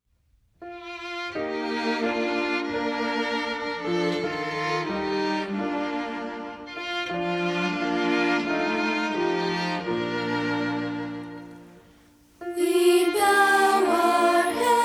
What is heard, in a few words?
we bow our heads words and music